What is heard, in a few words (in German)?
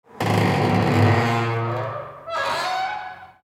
Tuer knart schliessen